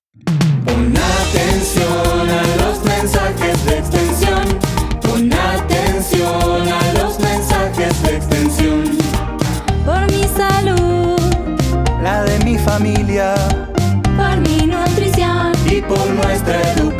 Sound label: spanish jingle